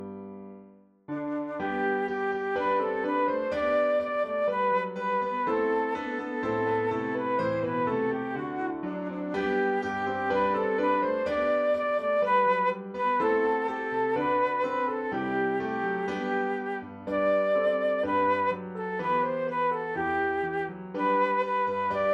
Piano Flute and Oboe

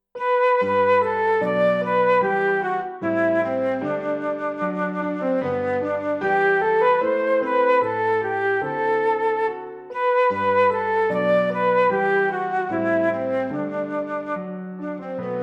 Wind and Piano, hymns without words

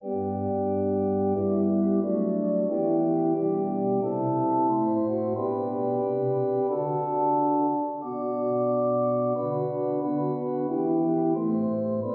Organ

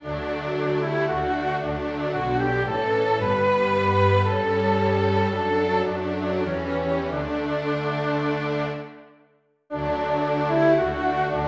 Orchestra

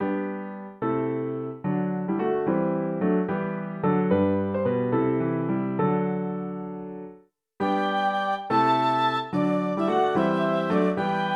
Piano and Flute, hymns without words

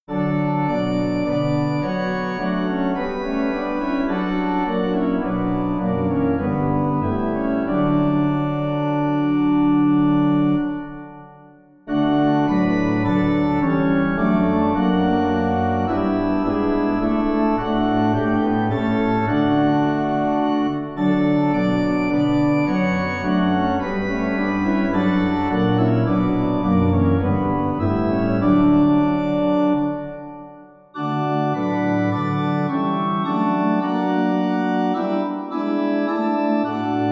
Organ; Cara; 5 Verses

